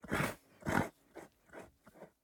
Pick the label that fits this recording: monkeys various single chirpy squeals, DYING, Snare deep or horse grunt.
horse grunt